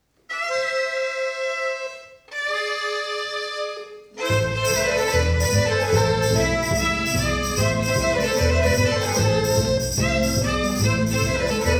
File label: Tarantella